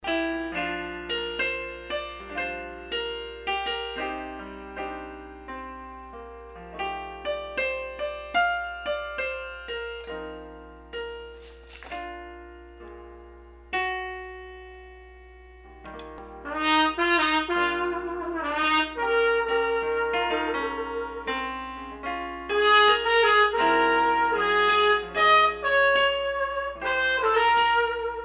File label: Body Soul